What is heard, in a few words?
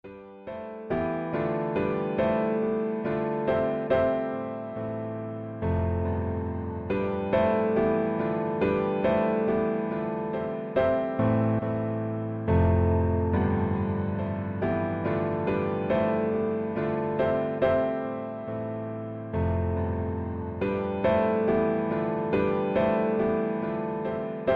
Piano Chord loop